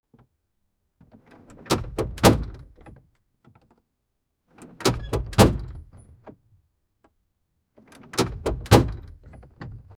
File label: shut car door